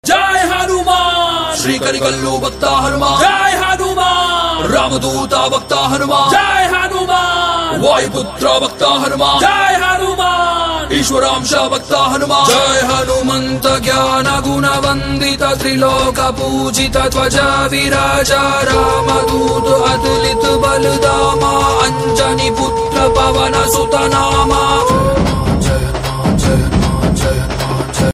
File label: Hanuman Chalisa Ringtone